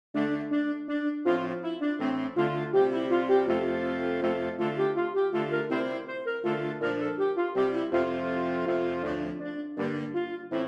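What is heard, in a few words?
Basse Danse, saxophone; Warlock; Capriol Suite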